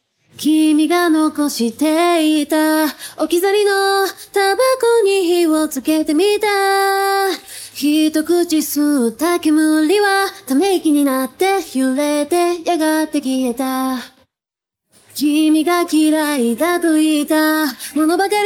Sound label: vocals splitted; lalalai